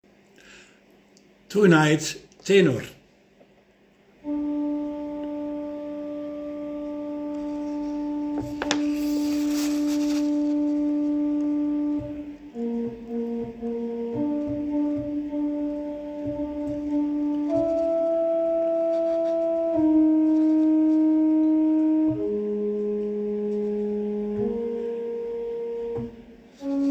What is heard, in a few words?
Tenor, West Side Story